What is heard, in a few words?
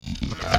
DIE; mahlendur